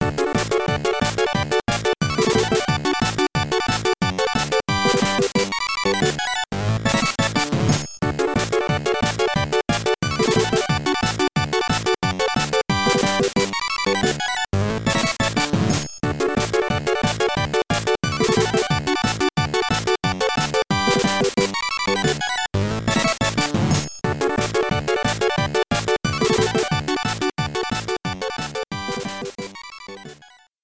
battle victory; Bomberman Users Battle; ボンバーマン ユーザーズバトル

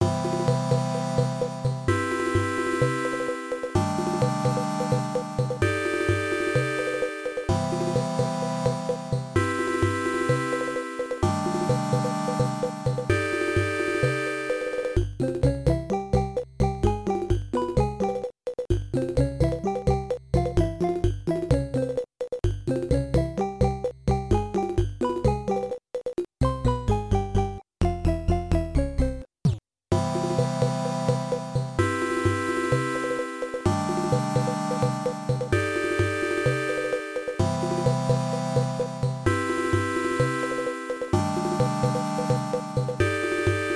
battle menu

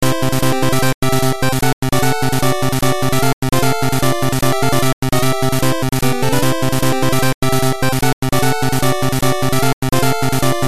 Round 1; Bomberman GB

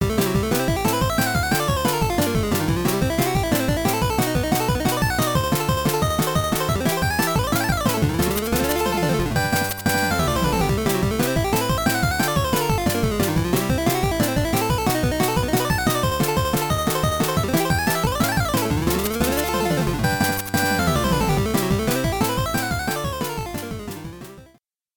battle victory

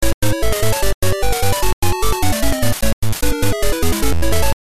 Vs. Enemy; Bomberman GB

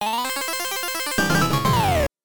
die

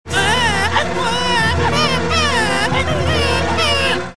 cry; megaman

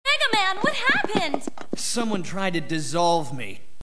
dissolve; Roll

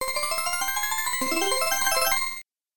battle win

